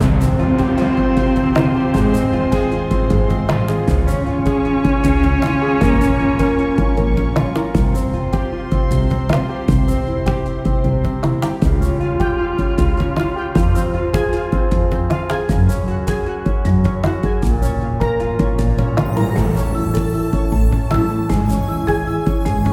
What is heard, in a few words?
No Cello; Necropolis